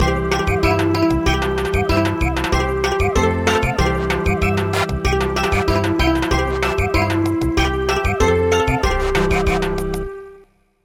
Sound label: Samba, traditional; Sleep my treasure